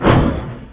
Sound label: turbo roar